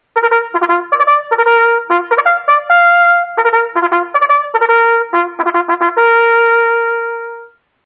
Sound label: Bugle Call Drill Call